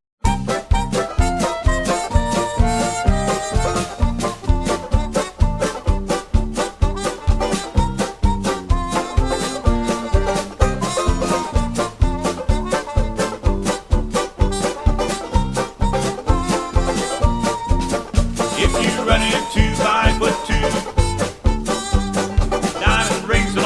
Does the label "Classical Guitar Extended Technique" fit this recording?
No